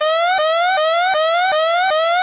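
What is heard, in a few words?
generator warning; CosmicRage; planet chunks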